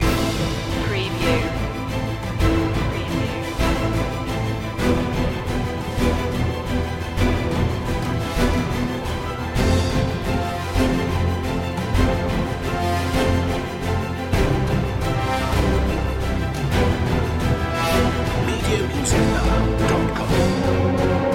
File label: no choir